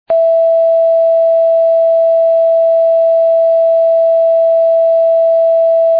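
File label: e natural, tuning, recorders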